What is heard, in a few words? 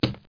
splats